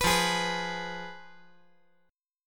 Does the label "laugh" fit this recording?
No